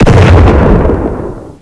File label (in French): tir, tank; svencoop